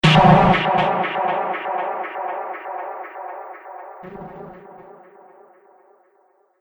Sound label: Heavy Future Explosion; Battle Sound Effects; Bombastic Booms And Battle Ambience